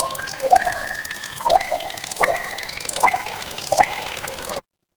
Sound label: water drip